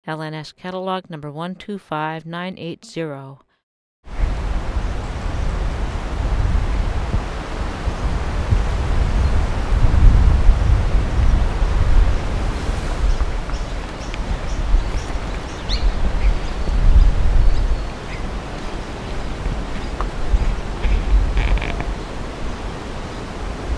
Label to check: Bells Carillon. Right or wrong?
Wrong